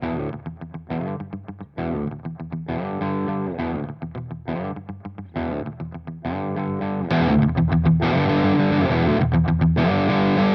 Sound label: Crazy Tube Circuits Space Charged V2 Humbucker Boost